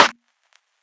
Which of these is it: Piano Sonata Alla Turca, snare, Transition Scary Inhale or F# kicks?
snare